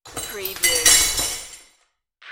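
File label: Glass Dump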